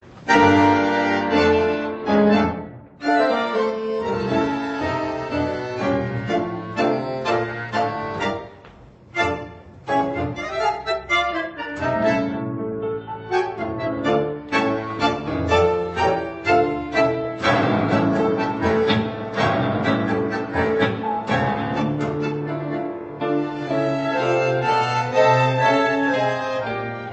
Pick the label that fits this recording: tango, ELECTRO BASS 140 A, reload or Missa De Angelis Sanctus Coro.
tango